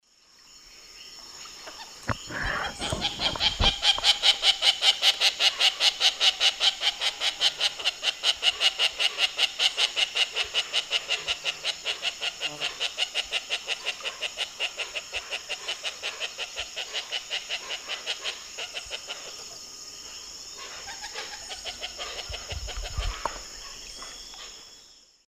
Jacana jacana, alarm calls; S. America; Eastern Lowlands

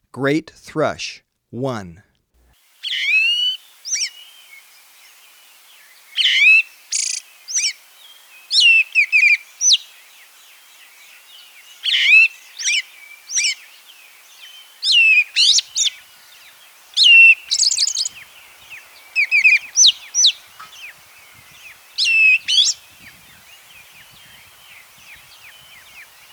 Great Thrush; S. America

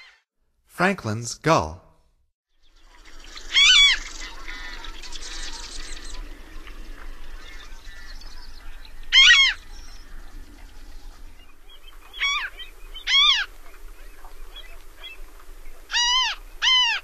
Franklins Gull, Songs of Birds of North America; Stokes

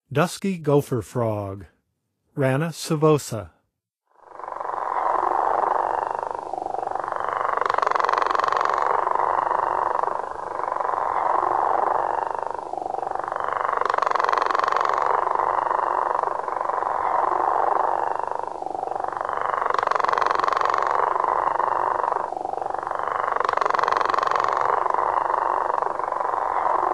Dusky Gopher Frog; N. America; Songs of Frogs & Toads of North America

